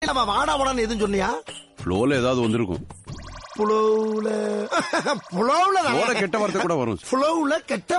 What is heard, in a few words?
srilankan ring